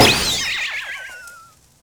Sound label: Confetti; Levels Hub Restaurant; Act Stage